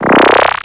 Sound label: Electro Ripple